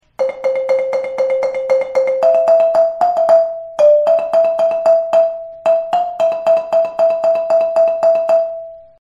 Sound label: marimba, layering end